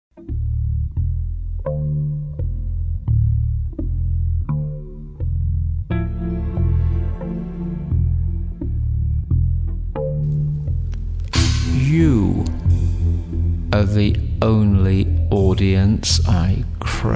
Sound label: Audience; BRAIN GUN; CULTURE COURT AUDIO